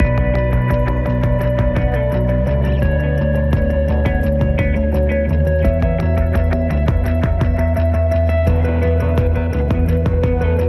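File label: Music Ambient, Ambient Loading 85bpm; Forza Motorsport 6 Apex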